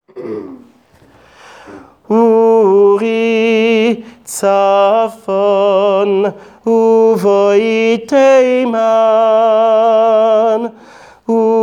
vocal

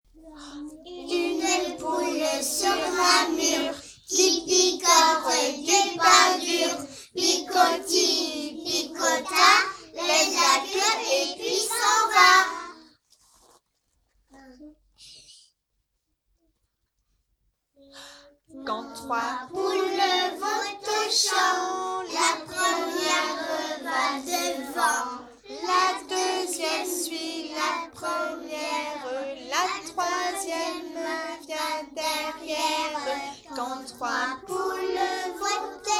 chants poules

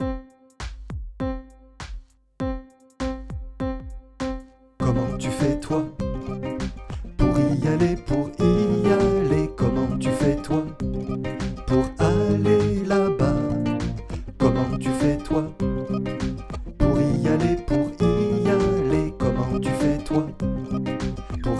refrain avec mélodie piano

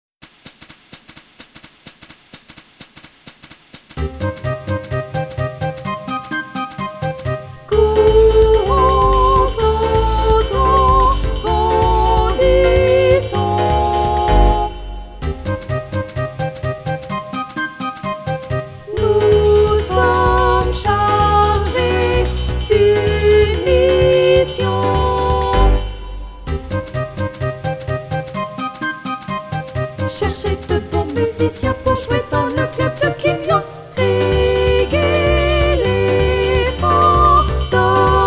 salsa des kangourous tutti

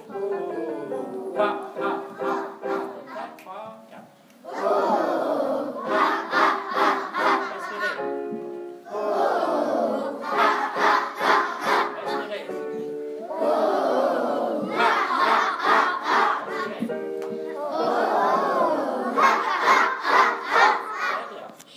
vocalise

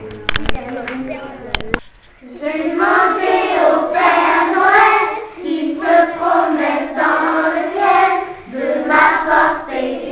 chant de Noel

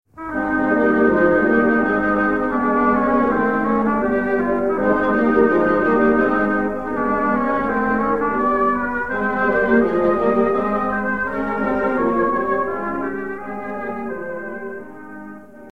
canard hautbois

